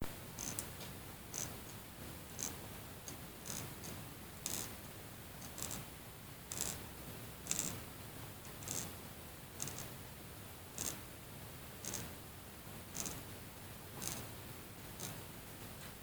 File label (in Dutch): boktor geluid